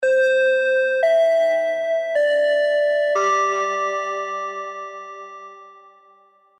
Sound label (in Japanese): kinkonkankon; チャイム 学校のチャイム。キーンコーンカーンコーン。